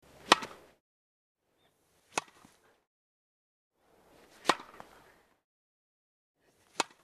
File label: tennis